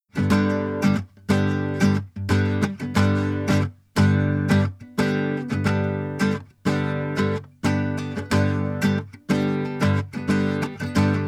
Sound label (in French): dynamique compresseur, micro; smpro